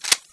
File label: arm; blobAndConquer; combat